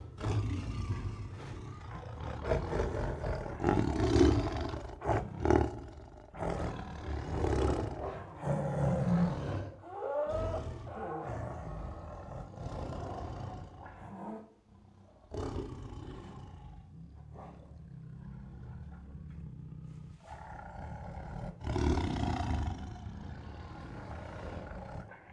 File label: Annoyed Tiger Makes Throaty Growls; Tigers; Sound Ideas; Tigers Sound Effects